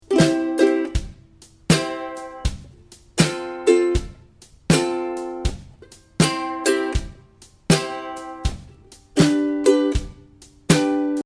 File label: strum, ukulele